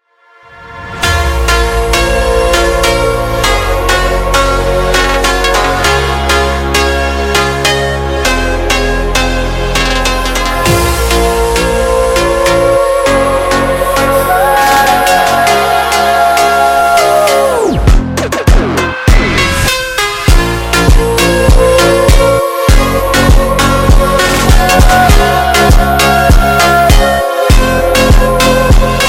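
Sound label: sped Up, phonk music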